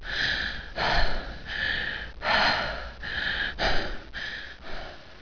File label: breathe